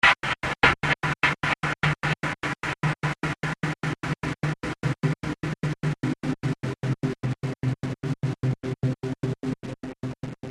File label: Cinematic Hit Bitcrushed Pulse; Hit Sound Effects